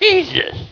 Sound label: pain